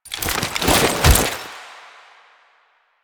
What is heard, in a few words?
Prone To Stand, Foley; Lasrian Lancer; Warframe; Lasrian Soldiers; Stance